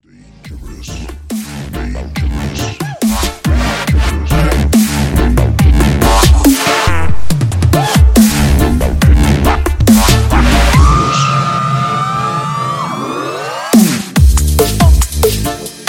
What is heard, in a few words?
Dangerous EDM